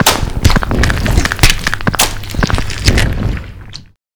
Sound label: effect gore sounds; lucius